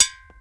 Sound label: metal; Mafie 1